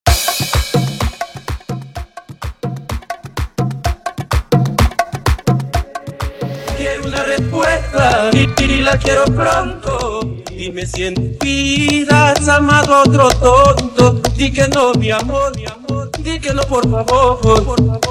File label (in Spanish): BOMBA INTRO OUTRO 127 BPM